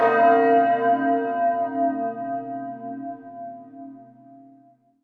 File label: bell tole; quake2